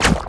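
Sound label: water; permanentaliens; giants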